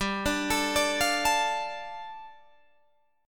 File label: G 7th Suspended 2nd, Guitar DADGAD